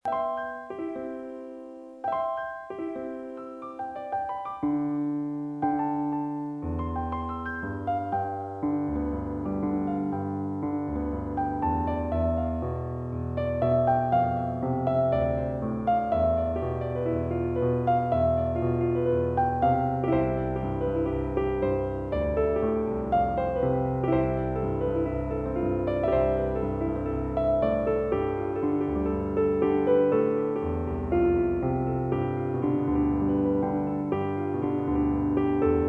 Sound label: Piano